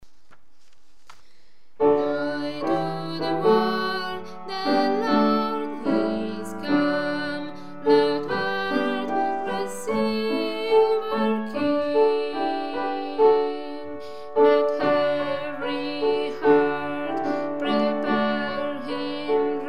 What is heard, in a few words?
joy bassi